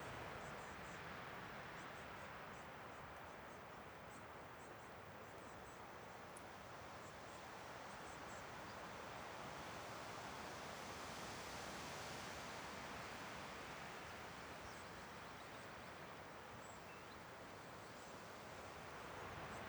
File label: summer spring loop